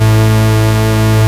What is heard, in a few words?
OB A2, VOX, Synthesizer; Hollywood Edge - Giorgio Moroder Rare Synthesizer Collection; OB 4 VOX.